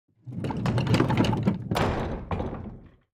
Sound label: wood gear